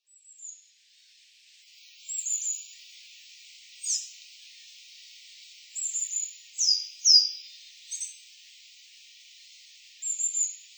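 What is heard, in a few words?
Coal Tit; higara; utsunomiya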